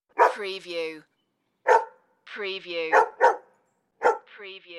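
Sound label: Dog 3 Barks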